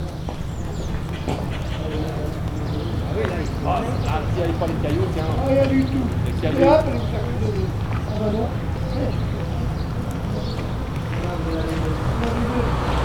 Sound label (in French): Place Petanque, village french